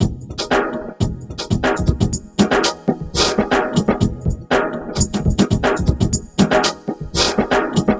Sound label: Vinyl Loop; Sonic Foundry (Sony Creative Software); Esoterik Beatz